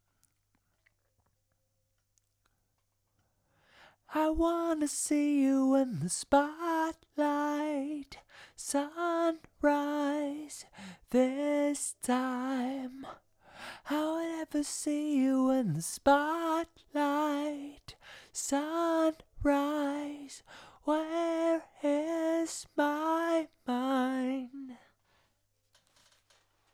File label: vox